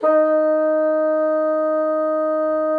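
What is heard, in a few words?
bassoon piano D#3; Xsample VOL-6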